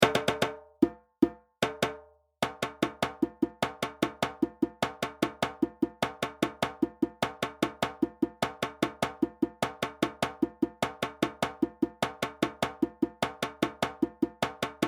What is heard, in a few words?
Djembe; Djembe 1 + 2; Sorsonet